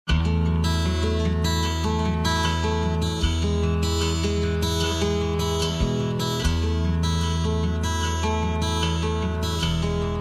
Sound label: Easy Listening & Classical; Sound Ideas